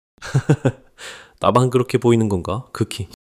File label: laughter